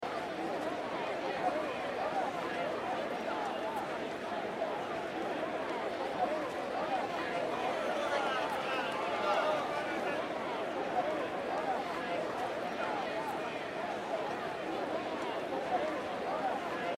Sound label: ambient city crowds